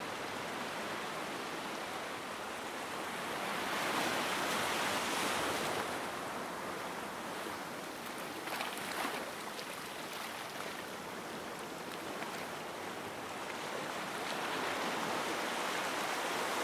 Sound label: Plains Path To Cliffs